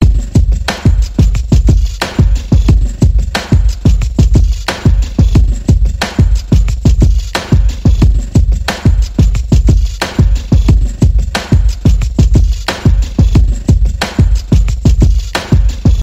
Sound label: Drum Beat